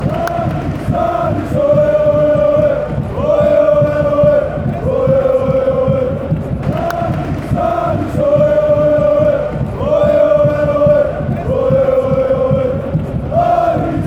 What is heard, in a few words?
aris aris ole, chants